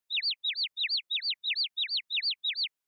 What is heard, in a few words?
Motion Dizzy Fast Short